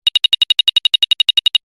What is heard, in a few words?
detector beep